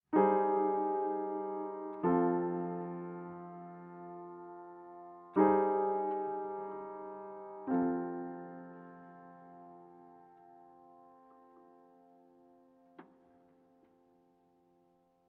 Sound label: tune